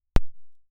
anechoic gunshot, starter pistol; OPENAIR

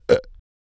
burp; OPENAIR; Anechoic

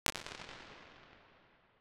courtyard, waveguide

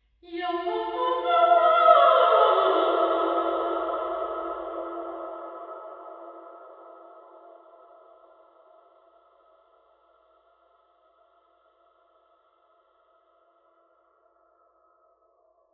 terrys warehouse sing; omni; factory

